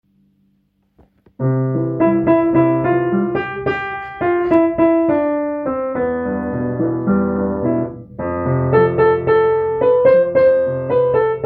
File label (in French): sopranes